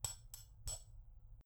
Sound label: hammer; tools